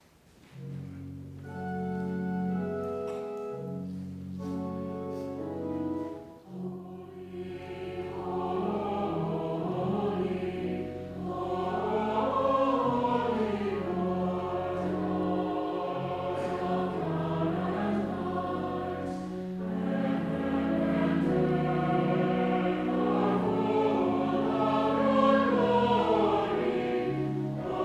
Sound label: Missa Dorica Sanctus